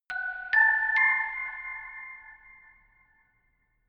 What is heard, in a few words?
notification glockenspiel creepy triad up diminished